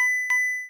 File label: bonus points; singe; spacerocks